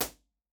ride IR, studio live room drum set; genesis 6 studio live room drum set; b-format

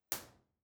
mouth, creswell crags; b-format; main level

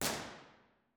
dixon studio theatre; b-format; university york

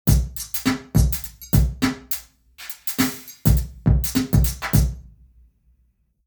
drum, auditorium; arthur sykes rymer auditorium university york

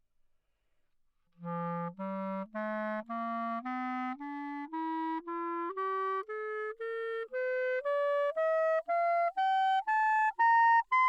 classical clarinet c, Anechoic; OPENAIR

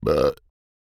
burp; OPENAIR; Anechoic

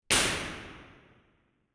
binaural, sound sculpture; tvisongur; iceland model

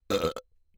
burp; OPENAIR; Anechoic

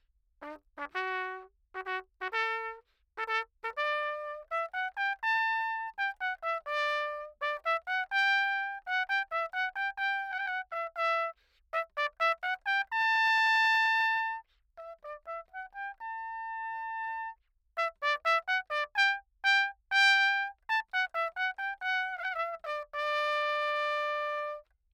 piece, handel trumpet, Anechoic; OPENAIR

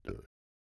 burp; OPENAIR; Anechoic

